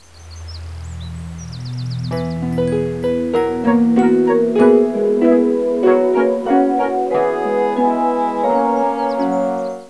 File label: tune